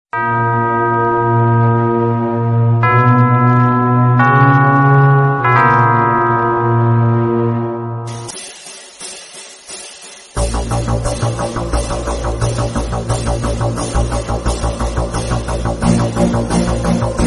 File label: church bgm; kgf; andrew